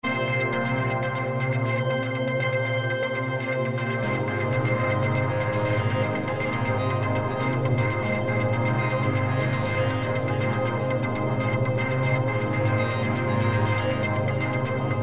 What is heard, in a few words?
Arpeggio